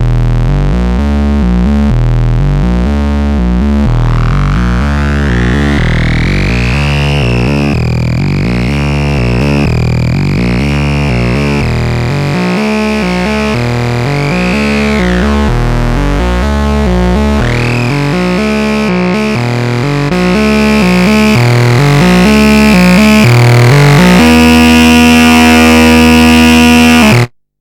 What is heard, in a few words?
Wave FM